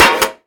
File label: Block Attack; kult